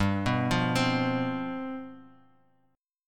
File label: G 11th; G11 arpeggiated; JGuitar